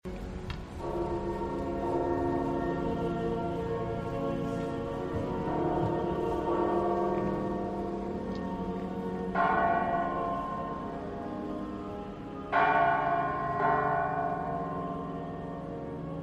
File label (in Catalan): campana